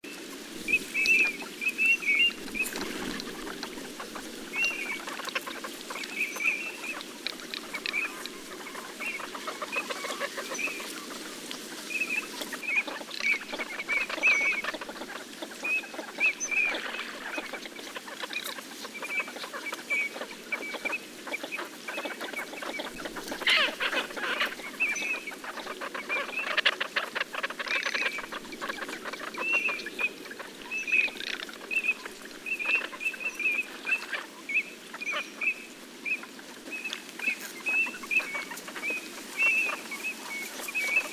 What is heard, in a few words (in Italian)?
Alzavola Anas crecca